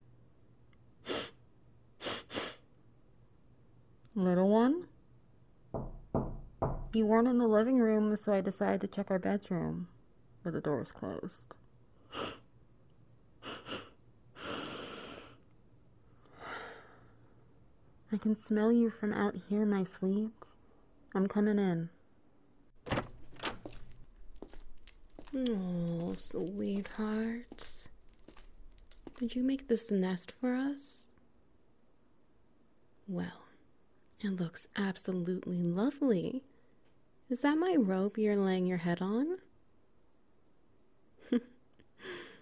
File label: SFX Wet Noises